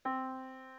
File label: Yamaha Disk, piano sound